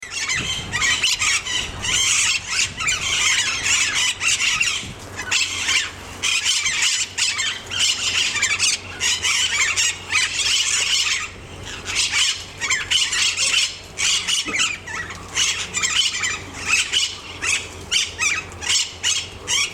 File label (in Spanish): cachana enicognathus ferrugineus